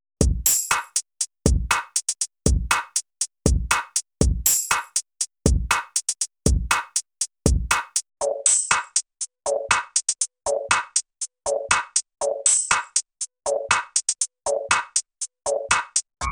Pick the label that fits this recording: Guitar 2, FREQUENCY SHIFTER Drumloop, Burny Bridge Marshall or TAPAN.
FREQUENCY SHIFTER Drumloop